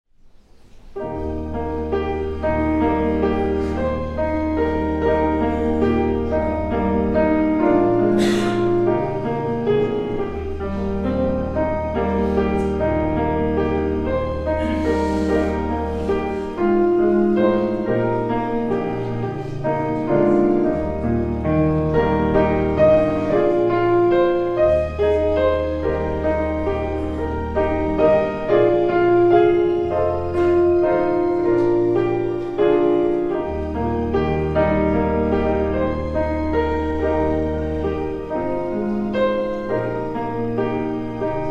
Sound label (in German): Vorspiel